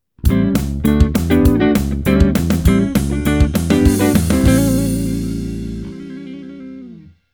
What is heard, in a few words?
pick